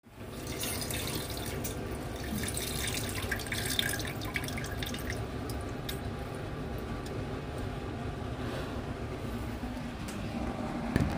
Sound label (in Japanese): ネカフェのおトイレで！オシッコですッ！